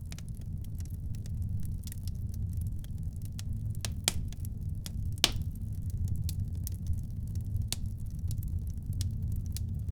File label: a gentle realistic firepl, popping